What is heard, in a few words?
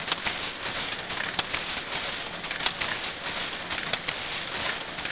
Gear; Sotha